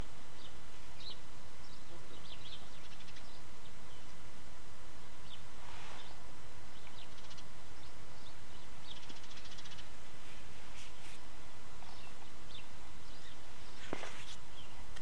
Journey into town, includes bus that sounds like a dog! Also birdsong, rain and me singing